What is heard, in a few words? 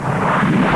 Cake Appear; Barrack Unity